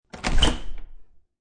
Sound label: Front Door Open, Opening house front door; Miscellaneous Door Sound Effects